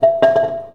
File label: Drop, INDUSTRIAL